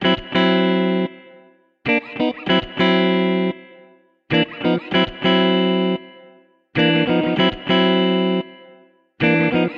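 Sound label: Guitar R&B D major 98bpm; Guitar and Bass; Ableton; Factory Packs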